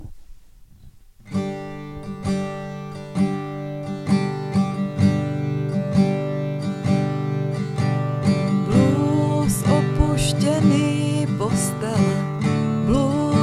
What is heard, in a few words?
blues